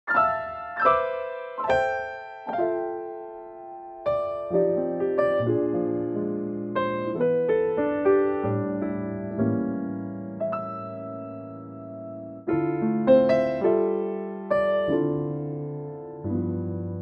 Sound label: C piano